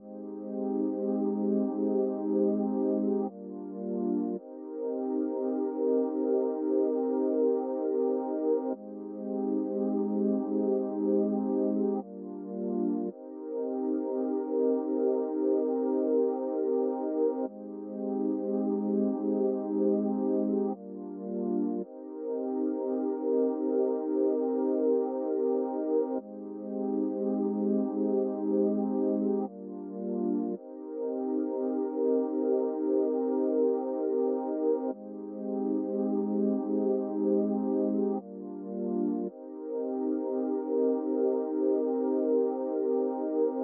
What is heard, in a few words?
110 BPM C Min Pad, RnB; Melody; Stems; Cymatics; 2022 Melody Collection (BETA); Seduction